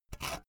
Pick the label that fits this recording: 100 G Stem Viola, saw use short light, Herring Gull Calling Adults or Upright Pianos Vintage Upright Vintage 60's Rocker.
saw use short light